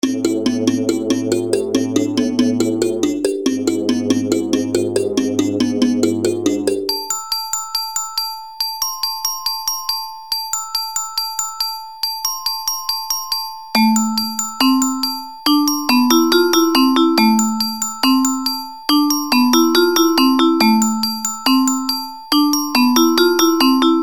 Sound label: mallet